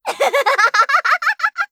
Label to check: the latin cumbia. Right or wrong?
Wrong